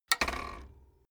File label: bicycle kick stand swing in